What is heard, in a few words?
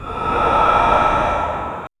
soft strained rasp from a, undead